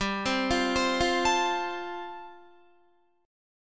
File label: G 7th Suspended 2nd Suspended 4th, Guitar